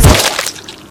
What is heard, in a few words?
flesh